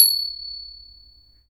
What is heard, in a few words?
CHING OP, FINGER CYMS; Spectrasonics - Heart of Asia CD2